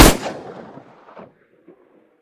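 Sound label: shooting at a target in height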